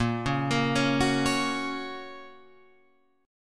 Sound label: A sharp Minor Sharp 5th, down, Guitar, Open F; arpeggiated; JGuitar